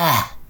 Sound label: pant; SM64 CO-OP; Junio Sonic